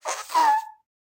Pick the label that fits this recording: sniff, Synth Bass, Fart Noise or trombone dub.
Fart Noise